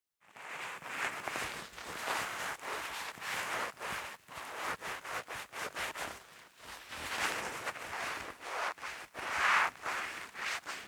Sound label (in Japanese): 耳かき, ASMR